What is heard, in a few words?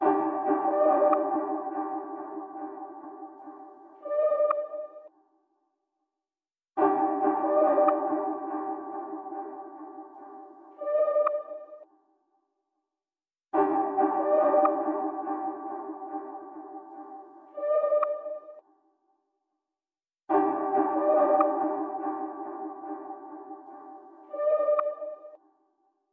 142 BPM G Min Accent, Drill; Melody; Loop Stems; Cymatics; 2022 Melody Collection; Pagan